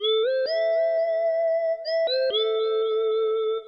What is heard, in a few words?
Synth Riff; Serial Chiller